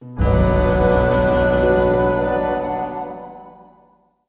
Awe chord; Indiana Jones and the Infernal Machine

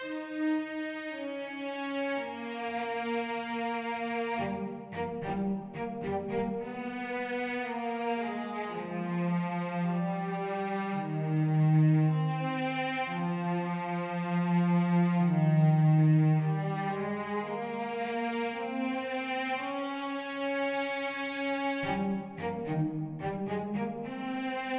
cello; Toki Tori 2+; Toki Tori Collection

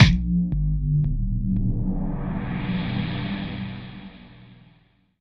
Channel Juice fall; miside demo windows gamerip 2023

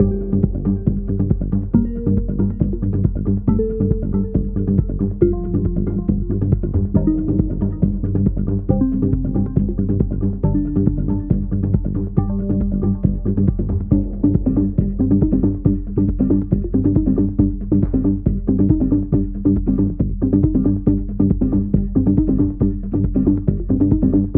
Panic Loop Filter; pang adventures

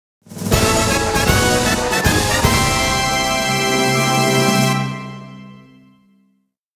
success fanfare

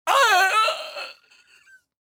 Voices male death grunt

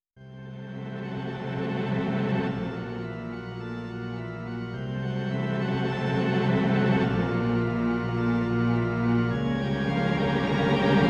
Drums, piano; Shin Megami Tensei III Nocturne HD Remaster Piano Arrange Rare Soundtrack

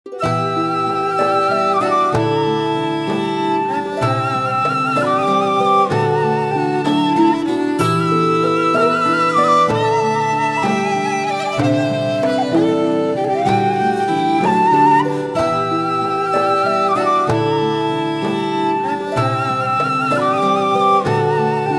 Tavern; Two Worlds 2

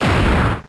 Rock Tomb

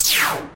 Pickup; Timez Attack

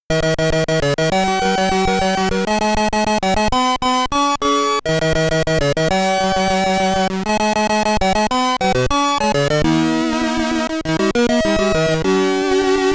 Introduction (SID Stereo); US Version

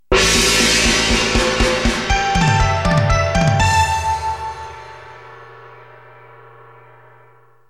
Chinese Jingle; Custom Robo Battle Revolution original soundtrack

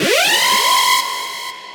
Robot Alarm; Robobeat

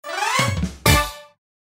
Battle Finished; Big Brain Academy: Brain vs. Brain

